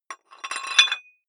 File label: dumbbell thread put on small weight soft